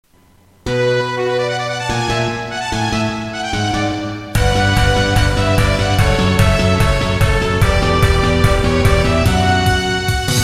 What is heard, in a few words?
battle